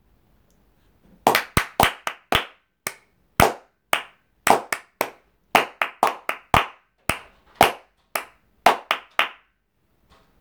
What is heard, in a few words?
Clap both students; Collaborative learning